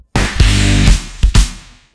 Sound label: pop sound; sound effects